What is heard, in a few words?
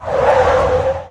Vehicles